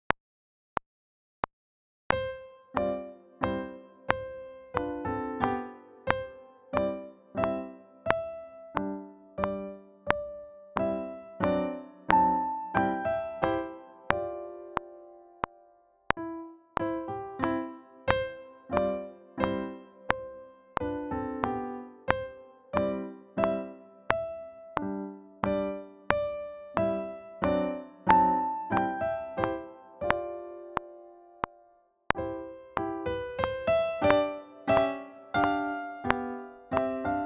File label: Waltz, duet